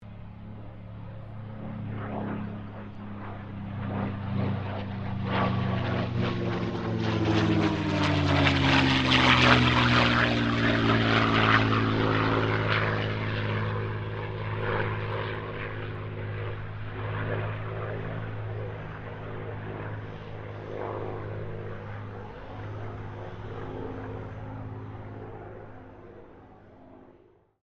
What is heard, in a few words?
AIRCRAFT CA-18 MUSTANG PASS; P51 /CAC-18 Mustang; Airplanes